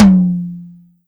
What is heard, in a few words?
Tom Mid H